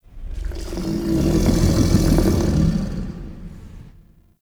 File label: Pirate Kraken Call Ambient; Warframe